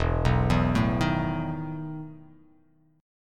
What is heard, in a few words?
E Major 7th Suspended 4th